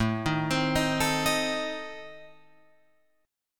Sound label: A Diminished, Guitar, Open F, Adim chord; arpeggiated; JGuitar